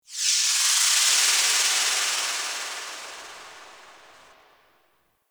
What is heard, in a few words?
Energy Thread Curve One Off; Warframe; Apostasy